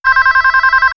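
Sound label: Incoming, electronic fx; X-Static Goldmine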